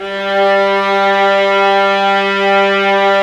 STR VAS, Amb f; Roland L-CD702; Vlas Sect3-4

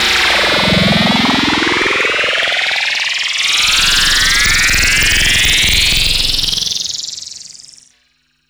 uprising, fx & sweeps; EZRollers

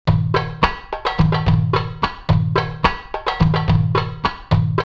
Damascus 108, drumloops World & Ethnic; X-Static Goldmine

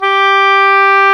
oboe; Roland LCDP04 Orchestral Winds; Oboe Brth 1

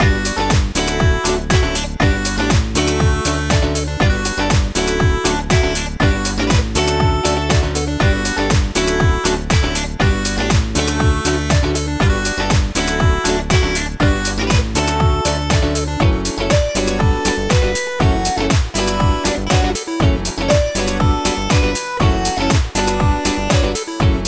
Full Mix, Happy People 120bpm D, Nu Disco; Zero-G

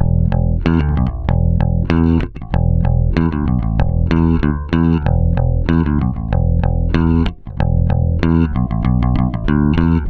BALBOA 95, Bass Grooves; USB Soundscan vol.30; AKAI

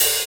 Ride; Equipped Music; Loungin House

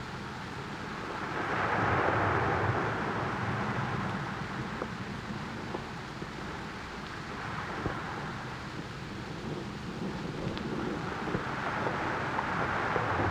Beach Dark, Water

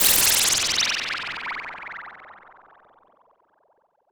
Whistle Down; Zero-G; Soundclash Nu Dance Electro; Acidized Wavs; FX Atmospheres

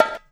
BONGOS, Percussion; InVision Interactive; Percussion Slam

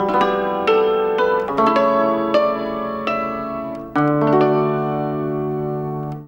ZIMB HIT; Best Service; World Colours

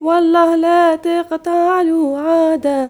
YOUNGIRL, Arabian Traditions

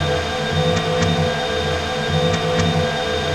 INDUSTRI; ENVIRN-AMB

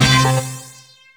DANCE STAB; E-MU Formula 4000 Series Vol. 2

